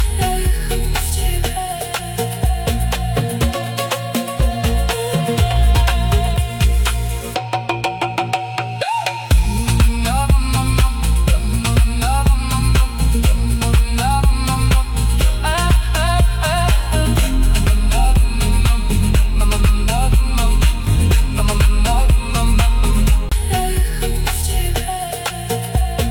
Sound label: Afro Deep House Mix